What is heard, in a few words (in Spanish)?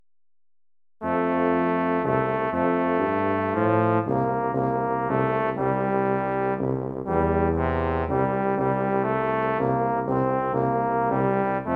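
danza para trombón tuba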